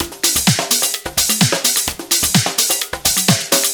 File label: Houseloops 128BPM; Vengeance Samples